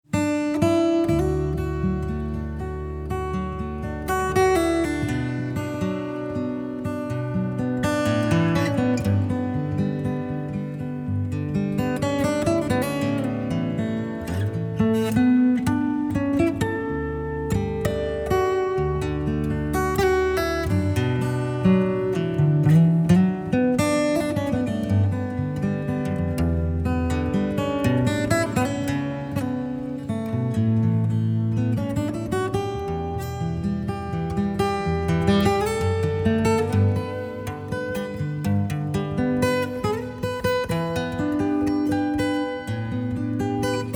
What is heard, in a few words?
Chant De Nuit